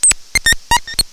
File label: square; synth analog; loops